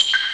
kling; Amiga Soundtracker sample

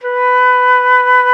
FLOTE SOFT H; FLOETE; Best Service; Alpine Volksmusik

